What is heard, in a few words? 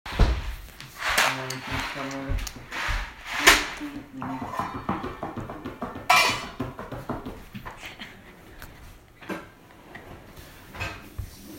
Counter